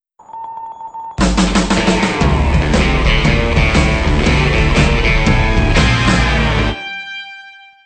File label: mission success; left 4 dead 2